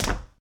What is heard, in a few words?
ceramic land; Golden Crayon Sounds